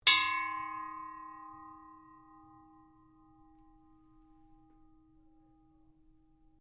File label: Ships Bell Ring, Various ships bells; Boats; Vehicles; Kakariki Sydney